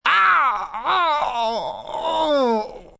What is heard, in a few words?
Pain Constipated, Pain, male, long; VoiceBox